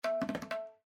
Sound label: Raga Feed Discovered, windows 7